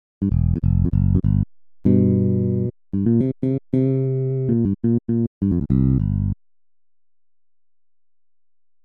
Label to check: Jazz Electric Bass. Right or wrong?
Right